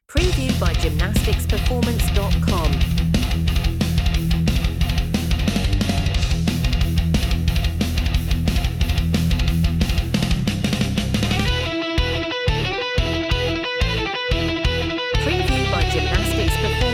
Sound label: opera metal